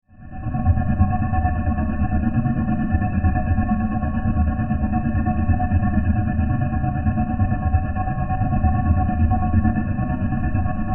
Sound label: Science Fiction Alien Power Drone Modulation; Spacecrafts